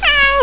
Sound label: miaow